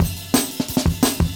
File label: 175, Breaks; Drum&Bass; EZ Rollers